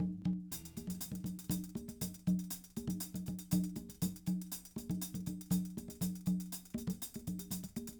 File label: hh con, Percussion; Big Fish Audio - DOM